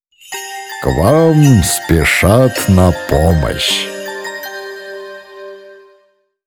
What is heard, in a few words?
call; new year